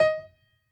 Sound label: piano hi